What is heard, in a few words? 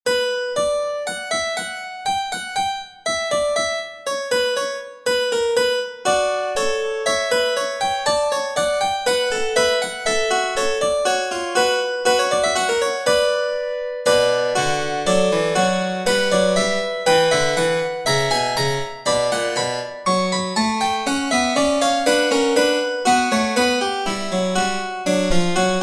fugue